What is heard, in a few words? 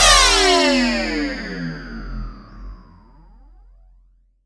Downsweeps